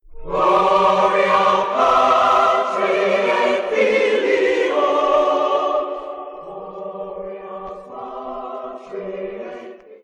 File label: 16th Century Church Music Gloria; Christmas in Cleveland; Glenville